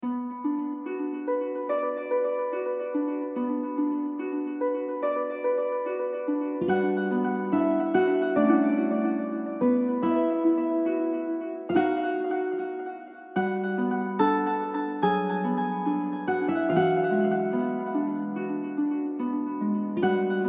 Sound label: bgm zone tower; zone5 tower10f; goken